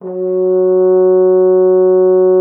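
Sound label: French horn F#3; Xsample VOL-3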